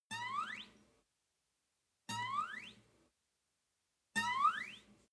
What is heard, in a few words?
string chirp slide up